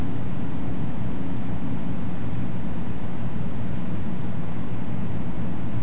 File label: unidentified sharp call 5.5-6.5kHz, nocturnal flight call; nocturnal flight call project in beijing autumn 2021